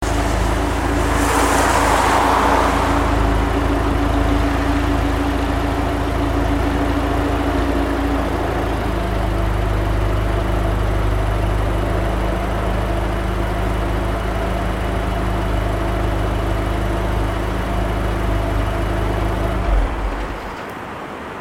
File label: mufler; Giulietta; unicorse-italial